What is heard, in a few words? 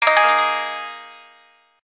gayageum star, keytone; SKY IM-A730S-VEGA-S